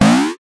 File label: cartoon jump